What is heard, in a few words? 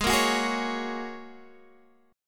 Bb Minor 7th Sharp 5th Ab